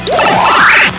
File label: warp; doom